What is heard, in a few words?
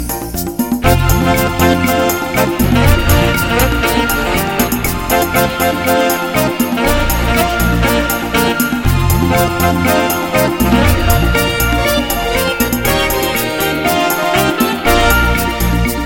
A Pretty Paso